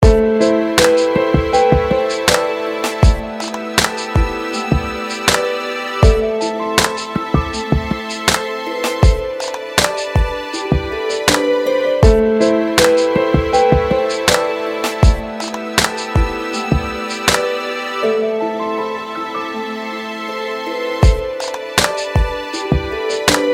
Relaxing Mix

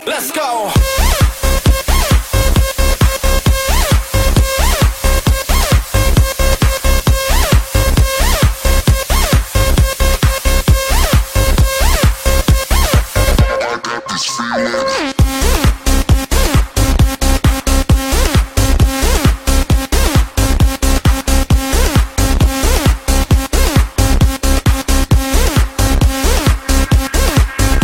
Dirty Electro 150

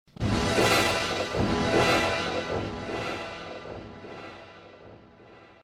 Dramatic Sms

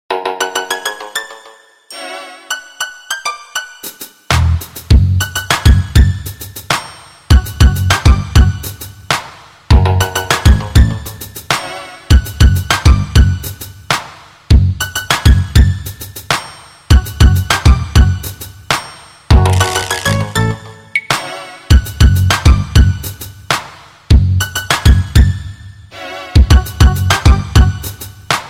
R N B Tones; Iphone4

